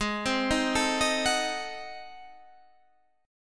G sharp 7th Flat 9th G sharp down Guitar Standard, chord; G#7b9; arpeggiated; JGuitar